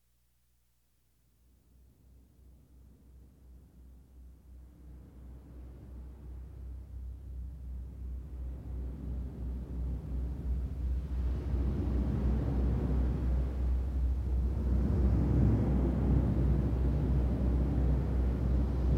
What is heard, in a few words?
Bells